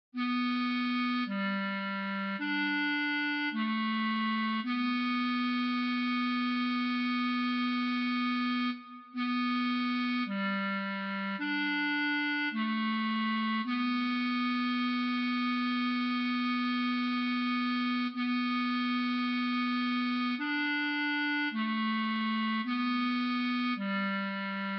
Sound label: Tenor, Rehersal Tracks; Christmas; What Child Is This? (Core Repertoire)